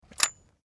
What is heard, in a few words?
Katana scabbard